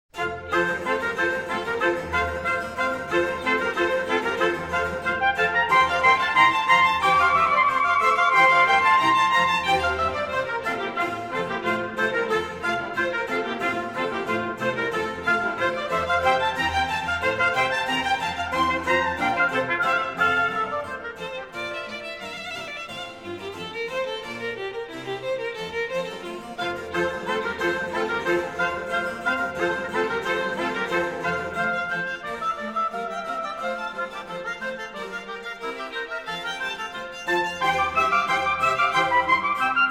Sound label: Concerto in F Major; Bach Brandenburg Concerto No. 2; BWV 1047 I. (without tempo indication)